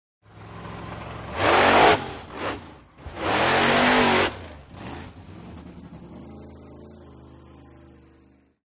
Monster Trucks; Revving By, Monster Truck Revving, Races By Thru The Obstacle Course; Shot Cu From The Obstacle Course.